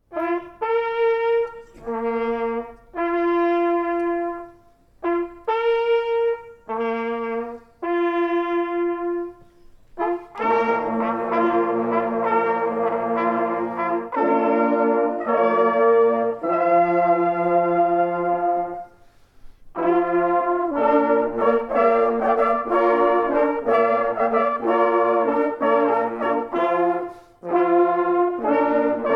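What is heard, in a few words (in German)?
Hubertusruf, Jagdhornbläser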